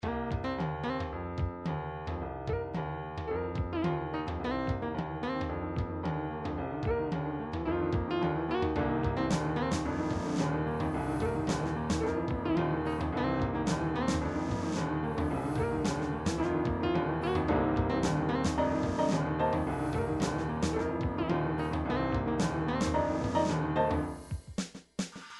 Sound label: triphop; Newtronic Triphop Construction Kit